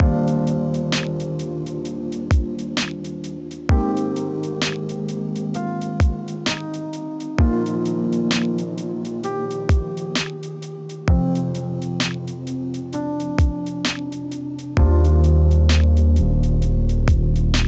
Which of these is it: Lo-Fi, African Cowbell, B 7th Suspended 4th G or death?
Lo-Fi